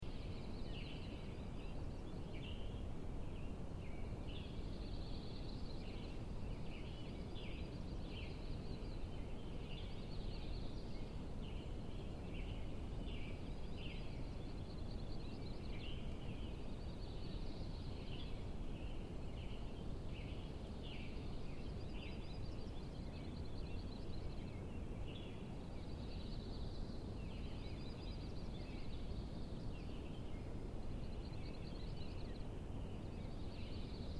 Quiet Forest Morning, Light birds ambience, light wind; Light birds ambience, light wind and a very distant waterfall. Sequoia National Park; Environmental Sound Effects